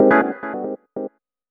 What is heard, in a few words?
TECHNO 140; Techno2